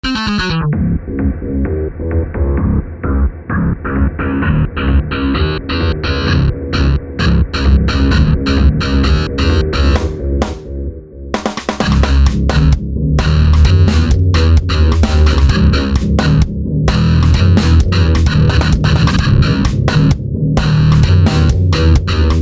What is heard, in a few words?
guitar